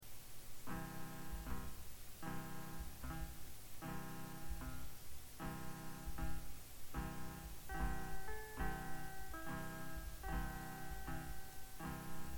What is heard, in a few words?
blues